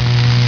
spark; Raiden

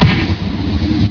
dive; mk2; Raiden

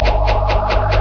girl trap; mk2; Kitana

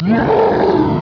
roar; mk2; Kintaro

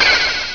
ice; mk2; Sub-Zero

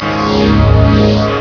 win; Raiden

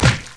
big stab; mk2

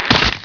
crunch; mk2

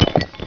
bones; mk2

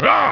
jax ahh; mk2

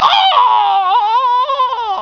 death; LuiKang

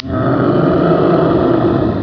tree roar; mk2

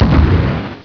blow; mk2; Reptile

